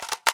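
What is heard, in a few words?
grenade load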